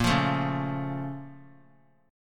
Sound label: Bb Minor Major 7th; BbmM7; strummed; JGuitar